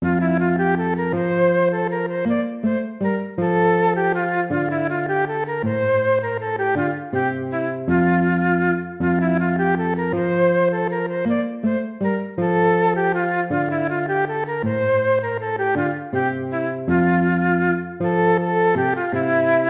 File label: lute